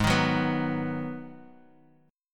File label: Ab Minor 7th down; strummed; JGuitar